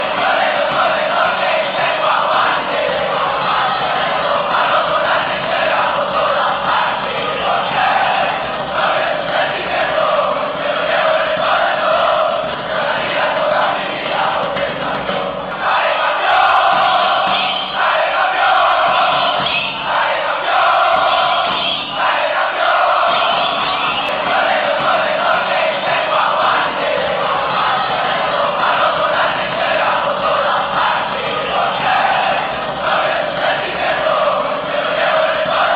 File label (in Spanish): trinchera norte fanchants